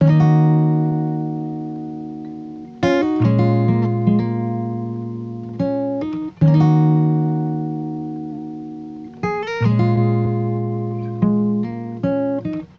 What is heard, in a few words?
Acoustic Guitar Loop Dry - 75 BPM D# Min; Melodics; Cymatics; Eternity Sample Pack; Guitars - Acoustic